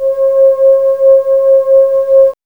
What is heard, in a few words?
MOOG; AMG - Vince Clarke Lucky Bastard